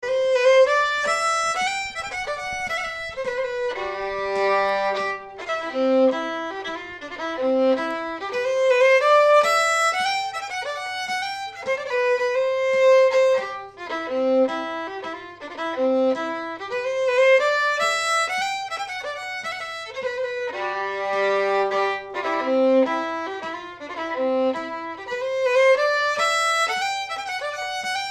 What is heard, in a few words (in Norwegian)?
Springleik Solo; Norge